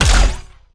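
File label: Laser gun Flanger Fire Heavy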